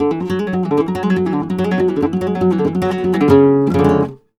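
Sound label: Flamenco Guitar phrase c#; Best Service ProSamples vol.46 - Flamenco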